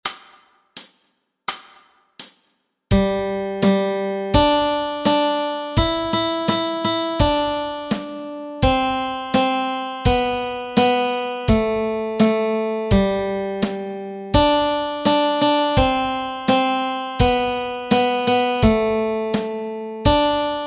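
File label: Melody Track, Easy Guitar and Ukulele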